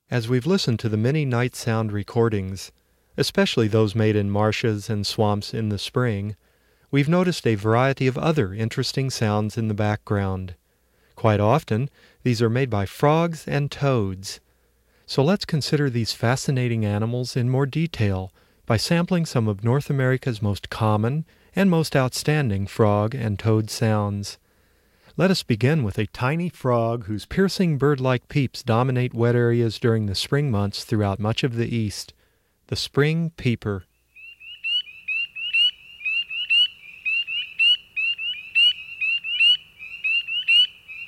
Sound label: Frogs and Toads, Night Sounds; Animals; A Guide to Night Sounds